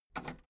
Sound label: metal lever button radio enable fast